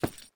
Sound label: crystal walk; blocks; step